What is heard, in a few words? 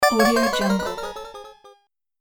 fall in game